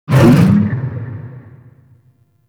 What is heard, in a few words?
Fishing Gulp; Duviri Maw Fishing